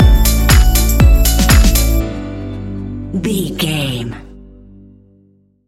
A fast paced piece of club music; A fast paced piece of club music perfect for the nightclub, and a youth orientated visual scene.; stinger

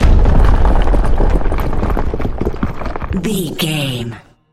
rock avalanche landslide debris

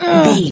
voice female effort quick action

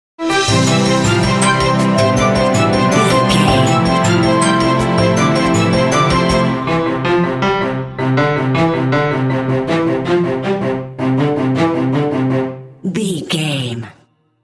perpetual symetric motion sting

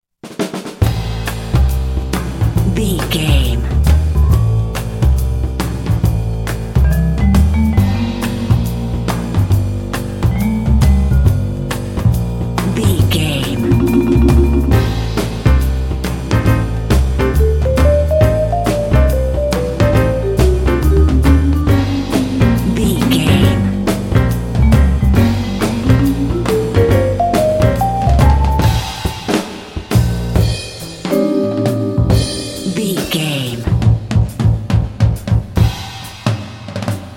super cool smooth and smokey

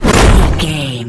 rock blast impact projectile

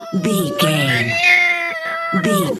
cat meow long

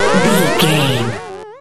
retro teleport warp effect

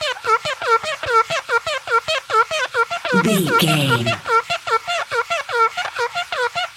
cartoon squeaky cleaning loop

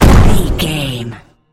gun tank cannon turret shot

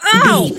voice female hurt pain

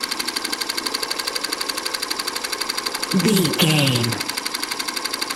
movie camera vintage shutter loop

